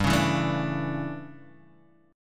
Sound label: D Major 7th Suspended 2nd Suspended 4th, G